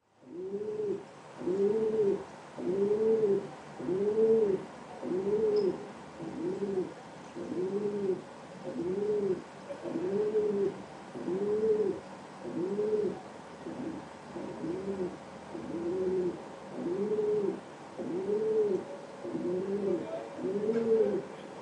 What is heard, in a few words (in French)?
chant pigeon biset; oiseaux